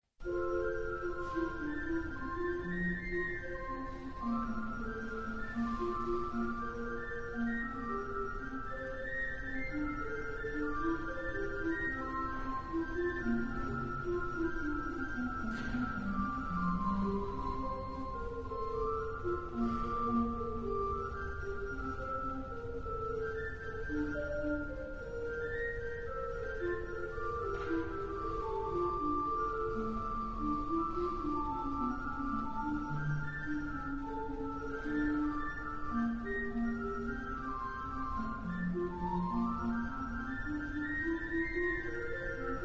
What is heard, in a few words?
Flute piece